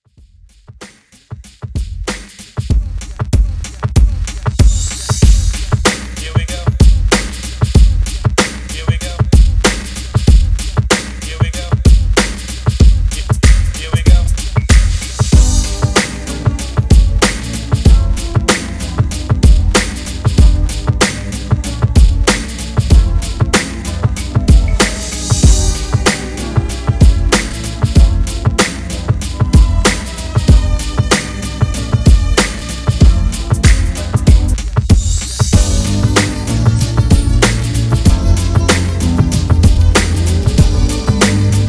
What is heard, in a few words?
Re drum